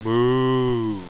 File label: moo; hall of moos